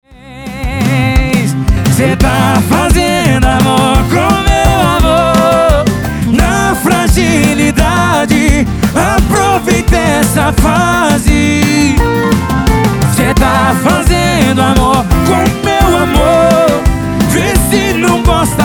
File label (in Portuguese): Sertanejo